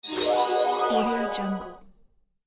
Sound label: notification start